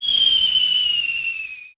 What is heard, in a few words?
bomb spawn; Pacificwar